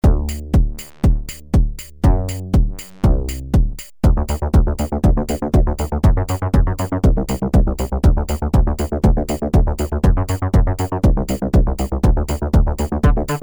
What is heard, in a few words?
Kilpatrick Carbon ARP Presets